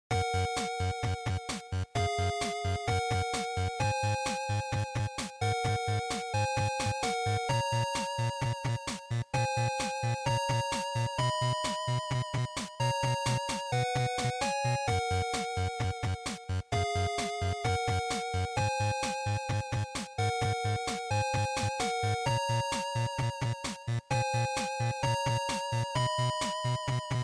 GB Action

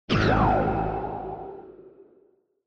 Retro Anime Accent Low

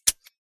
Padlock-unlock

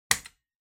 Light Switch-Wide Panel (Dry-Hard)

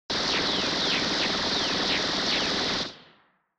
Retro Anime Gun Short

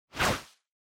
Motion Agility Mid

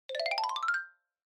Xylophone Slow Long Up

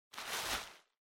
Bubble Wrap Rustle; 梱包材; 気泡緩衝材; ガサガサ

